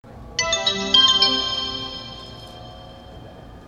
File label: chime; nankai